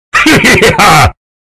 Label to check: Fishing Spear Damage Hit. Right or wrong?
Wrong